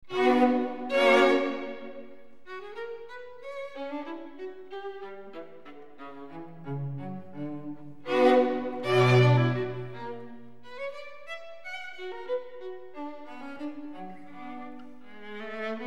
String Quartet in C-Sharp Minor, Allegro moderato; String Quartet No. 14; Op. 131; Ludwig van Beethoven; Beethoven - String Quartets Nos. 1-16

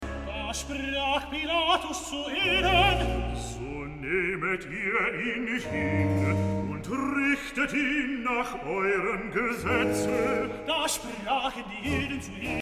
Recitative; Bach, J S; Johannes-Passion, BWV 245, Pt. 2; IV. Recitative. Da sprach Pilatus zu ihnen

